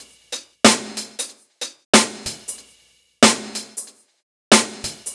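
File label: Hat Snare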